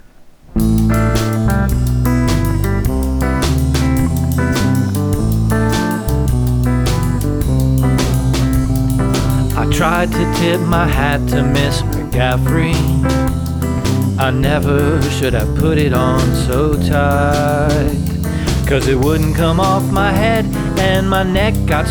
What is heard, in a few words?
Tight Hat